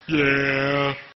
Goat yeah

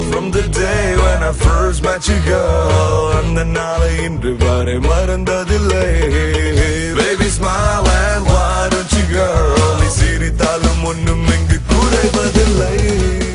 the 70s, rock

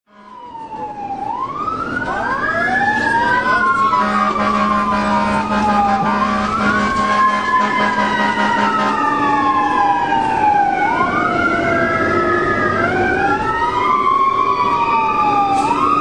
ride along ambulance, fire dept; pgfd

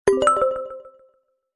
htc color, sms

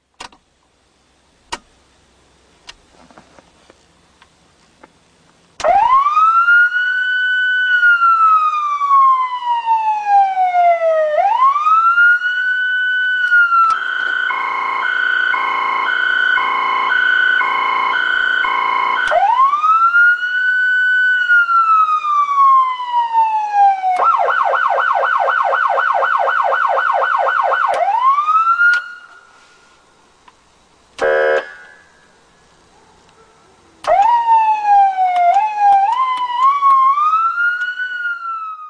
federal signal unitrol omega 8001 siren

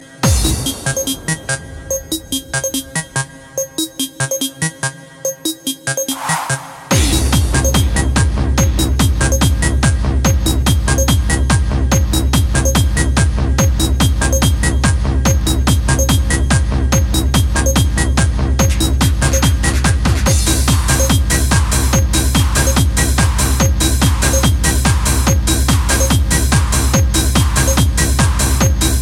wow techno, ringtones

